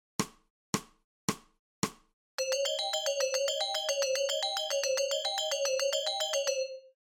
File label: scale